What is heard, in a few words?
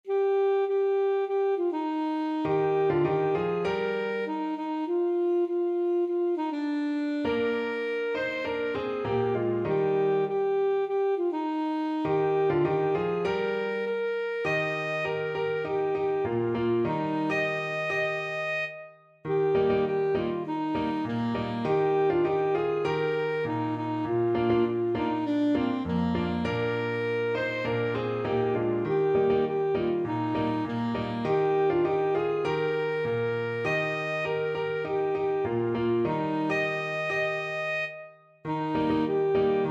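alto saxophone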